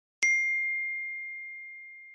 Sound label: iphone note